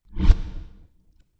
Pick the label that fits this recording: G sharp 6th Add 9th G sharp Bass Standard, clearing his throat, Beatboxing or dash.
dash